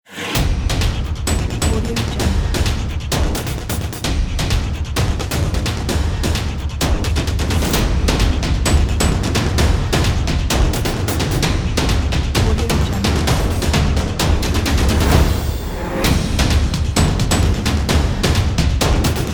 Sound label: open drums